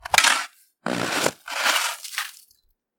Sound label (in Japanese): yukikaki